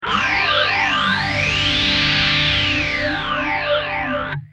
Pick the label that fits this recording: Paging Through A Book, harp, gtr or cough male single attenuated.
gtr